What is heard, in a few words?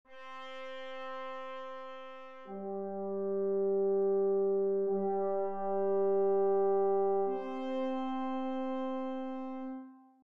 brass; Nikolai Rimsky Korsakov